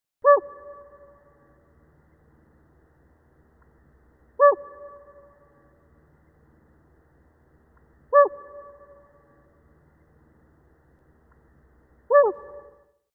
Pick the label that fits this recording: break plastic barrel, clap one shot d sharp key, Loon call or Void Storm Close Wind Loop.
Loon call